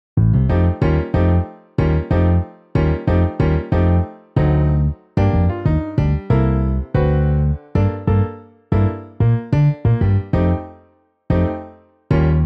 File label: Piano Bass MIDI